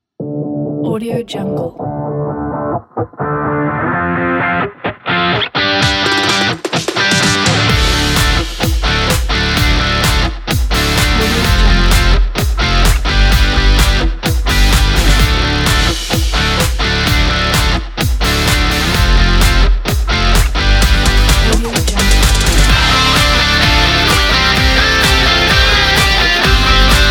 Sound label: energy spring pop rock